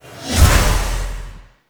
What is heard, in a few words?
Zenurik Temporal Drag Cast; Warframe; Focus